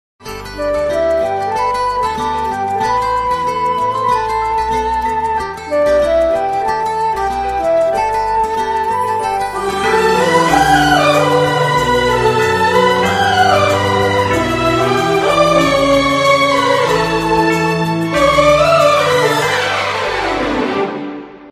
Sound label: instrumental ringtone